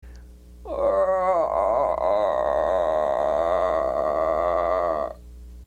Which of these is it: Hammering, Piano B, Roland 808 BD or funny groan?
funny groan